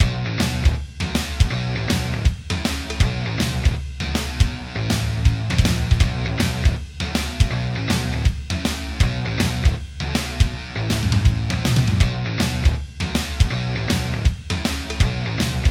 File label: 3d Music